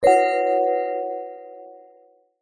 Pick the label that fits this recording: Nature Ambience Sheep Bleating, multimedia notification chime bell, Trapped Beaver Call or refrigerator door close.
multimedia notification chime bell